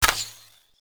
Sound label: hornet poison needle